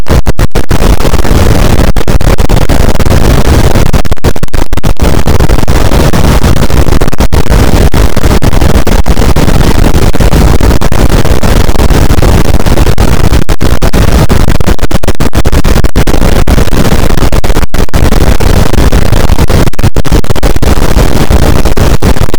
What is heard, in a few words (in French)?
cachalot negatif, boat, Physeter Macrocephalus